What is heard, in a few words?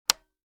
switch